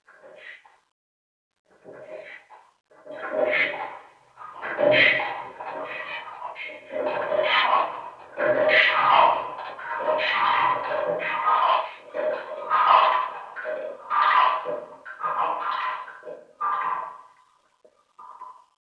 Locks Gated, Chalice Well

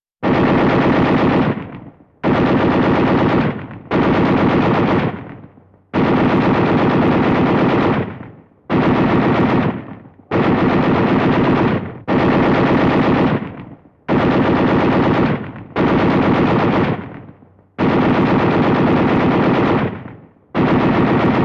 Gun mounted machine gun

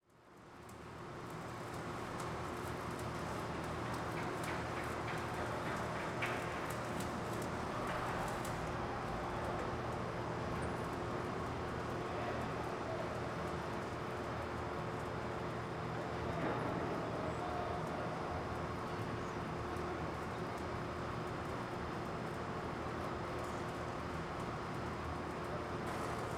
Ambience, Earth & Steel

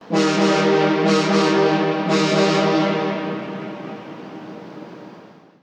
BC Ferry Echo

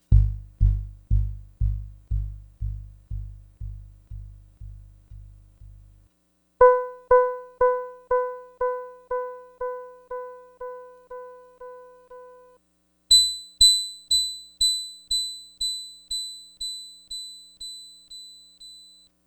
Equal Loudness

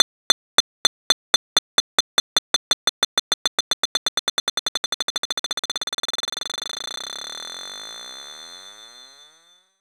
B bounce

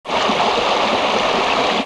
creek

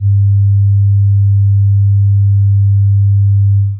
Sine Tone

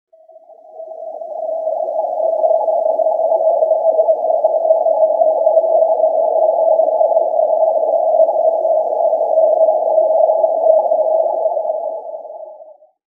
Water Stream Grains Fast Sine, Chalice Well

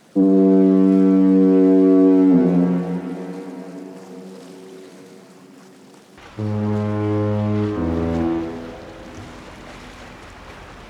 Foghorns

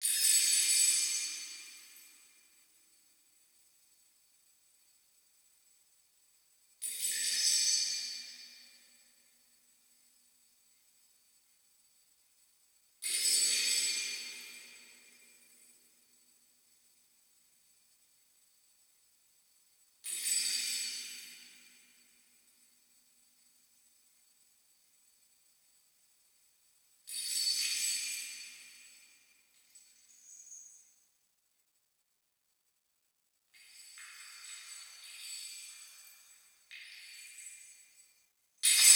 Splashes Glassbreak; Chalice Well

